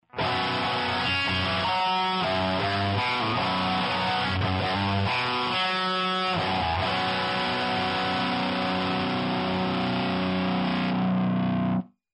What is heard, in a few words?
1968 Plexi Variac